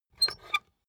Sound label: puncher squeak punch empty